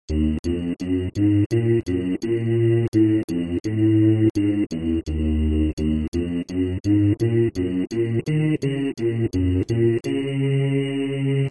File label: Cellos Song